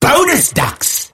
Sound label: bonus ducks